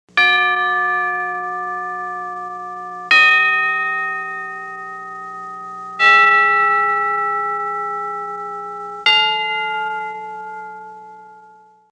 Tubular Bell Ascending; Bell Sound Effects; Bells (Sound Effects:Musical)

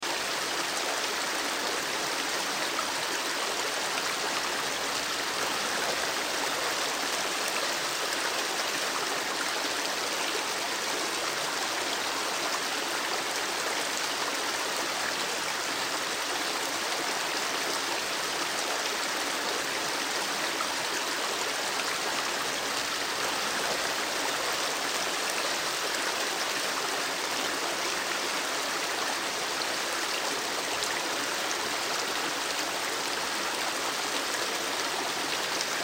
River Run Flow, River Run Flow Small Loop; Nature; Water; Blastwave

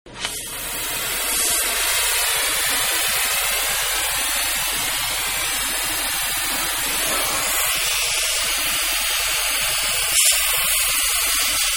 Acetylene Torch; Welders; Machines; Manhattan Production Music

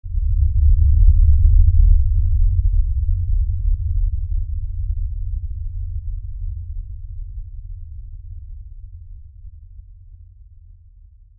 Impact Lfe Rumble; Impact Lfe Rumble Pass By Slow; Low Impacts (Sound Effects:Impacts); Blastwave

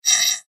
Beep Tone Signal Multimedia; Web Interactive; Beeps; Blastwave

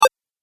Game Power Button, video game

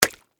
Impact Egg Drop; Texture; Vegetable; Drop Sound Effects; Objects

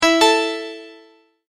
multimedia tone verified; Sound Design; McKinney Sound

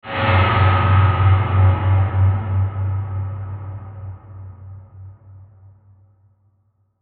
Whoosh Reverb Pulse Low; Blastwave; Whoosh Sound Effects

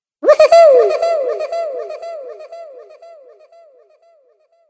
Cartoon Game Voice Wuhoohoo Chasm Echoes

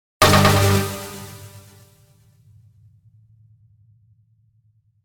Hit High Filter Delay Synth; Blastwave

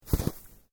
Footsteps Boots Snow Step; Footstep Foley; Human

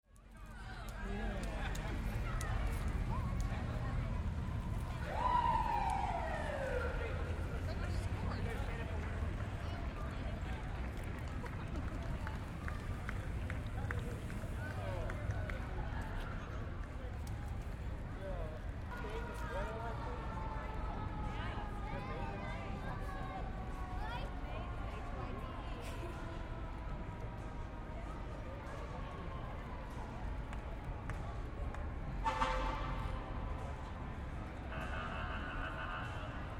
Street Parade Scottish Bagpipes; Celtic/Scottish parade in U.S. city. Crowd in streets; City Sound Effects

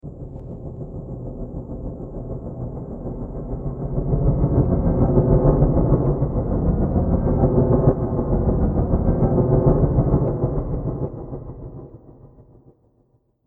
Horror Piano Tremble, Piano Sound Effects

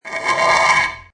Metal Scrape long; Metallic; Multimedia; Lynne Music

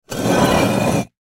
Rock Slate Movement, Rock Slate Movement Slide; Materials; Foley; Blastwave

